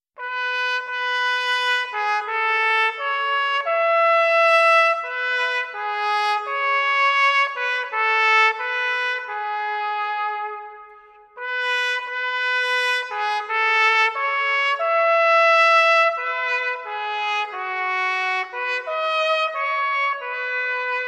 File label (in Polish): hymn trabka